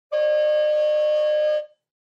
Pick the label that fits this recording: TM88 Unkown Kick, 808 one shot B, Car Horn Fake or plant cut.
Car Horn Fake